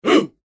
うっ

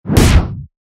斧 通常攻撃; モノラル; 武器効果音

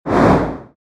モンスターの羽ばたき 1回

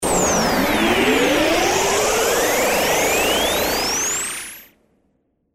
ワープ音

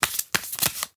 トランプのシャッフル

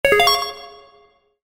決定

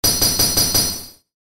ランク レア度5

